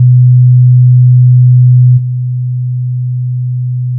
soundproof effect 125hz; ノイズクリアの防音効果

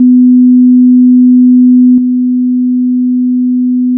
soundproof effect 250hz; ノイズクリアの防音効果

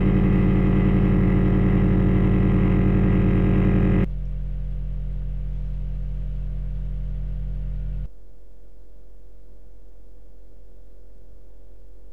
structure borne air borne no sound bridge

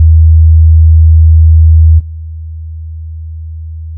soundproof effect 0080hz; ペアガラス対応; 透過前：3秒 ⇒ 透過後：3秒; 透過損失

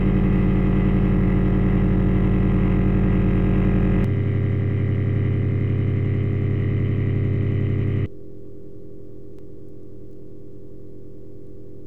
structure borne air borne on soundproof sheet

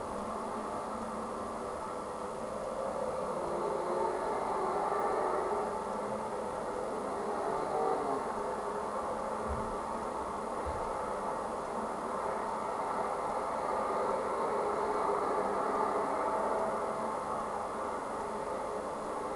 soundproof effect traffic noise; ソリッドガラス; ソリッドガラスの防音性能

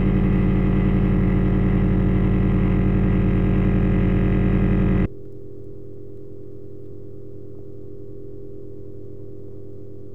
structure borne air borne sound

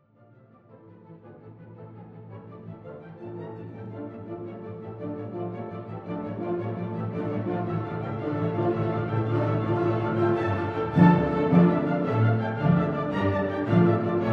soundproof effect music; 《基準壁》からの音; ノイズクリアの防音性能; 《ノイズクリア設置後》 の音

